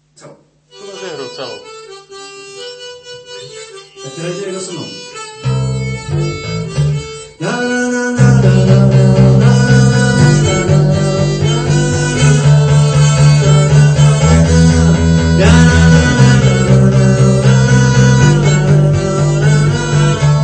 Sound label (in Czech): barvy solo; Nahrávka ze zkušebny